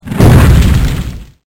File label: tree burn